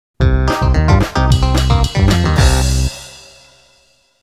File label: clavinet